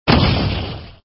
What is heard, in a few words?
bomb; neimeng